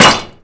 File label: knife painted metal; Call of Duty Modern Warfare; physics